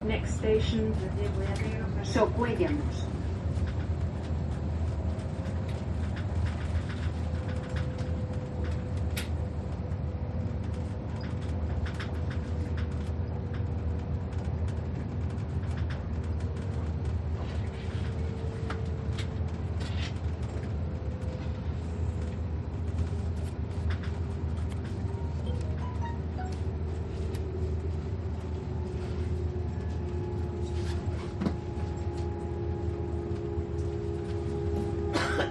Train Spain PA Ride Slow Walla People Shuffling Around; Renfe